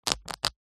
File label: foley cloth thick rip